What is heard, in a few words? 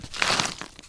petrify shake; edgar; boss; gargoyle